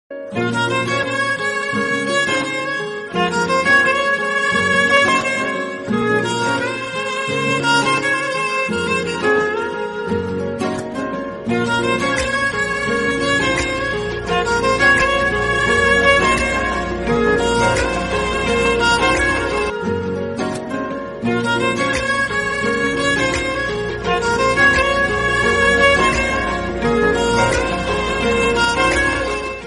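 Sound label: heart touching ringtone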